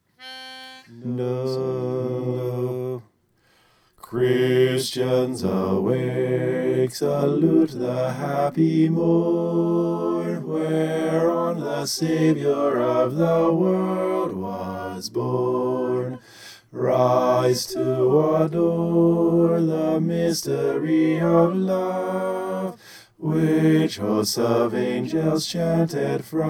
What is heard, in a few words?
bass